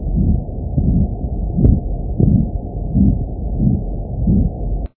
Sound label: vesicular, lung sounds; breath sounds